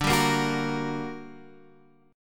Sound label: G Minor Major 7th D